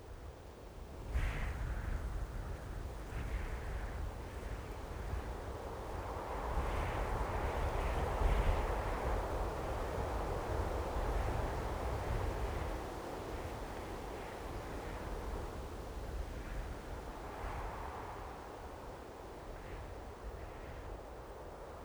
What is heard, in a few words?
wind loop long